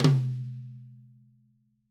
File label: Tom Low, Drumkits